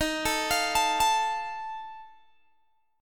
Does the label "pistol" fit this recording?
No